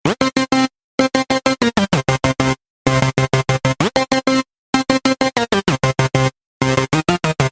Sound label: electro